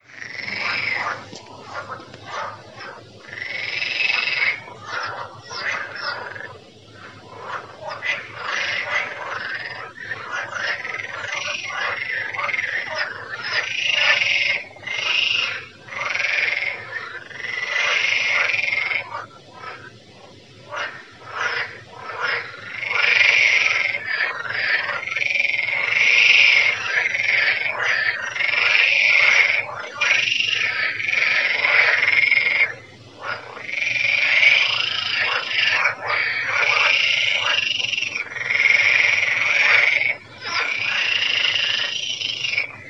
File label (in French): Grenouilles Saint-Palais